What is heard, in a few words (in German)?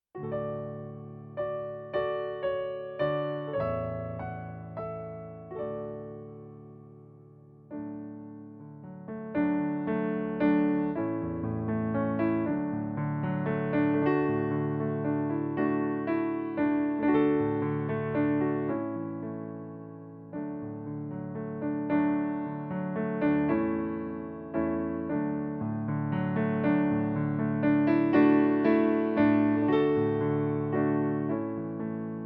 Playback D-Dur; Großer Gott wir loben dich